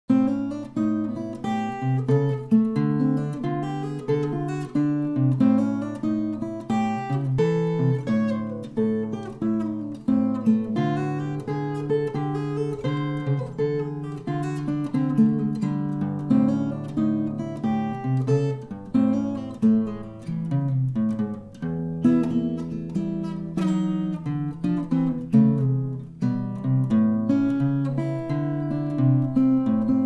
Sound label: blues